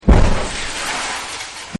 Thump And Shatter; Smashes; Objects

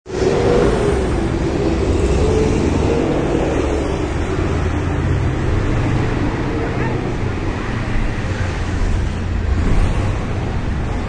City Street, Busy city street; Cities

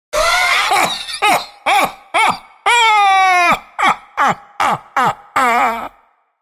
Full hearty male laughter; Voice

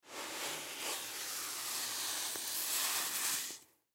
Sand Movement; Sand Blow Hard; Playground Sound Effects; Foley

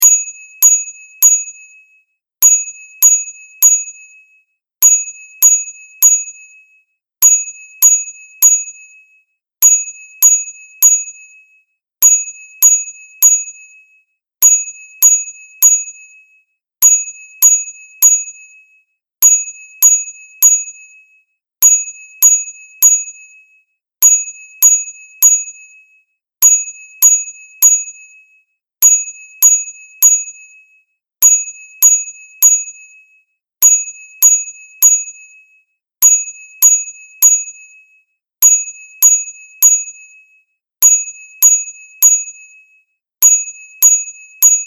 Bell Chime Seamless Loop; Bell Sound Effects

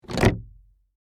Door Latch Open, Foley, Wood, Door, Latch, Open; Latches; Objects; BlastWave

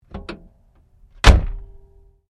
Bonnett Slam, car; Hyundai Accent 2008; Hyundai hire car; Vehicles

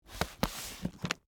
Bible Pick Up, Book; Foley; Book Sound Effects; Offices; Blast Wave

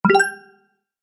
Health Boost, video game; Twistedtracks

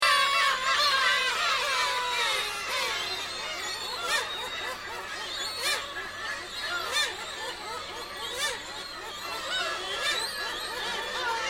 Ambience Hachinohe Seagull Island Loop; Island temple in Japan with lots of gulls living on it; Outdoor Sound Effects; Foundation1

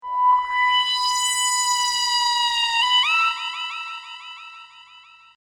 Hip Hop Synth Licks 90 Bpm B; Synth Loops; In-The-Dark Swing Var B; Royalty Free Synth Loops

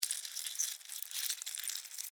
Insect Move Bug, Movement; Animal; BlastWave

